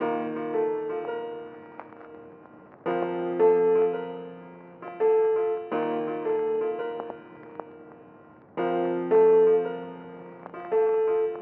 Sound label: 168bpm keys; Bleu Moon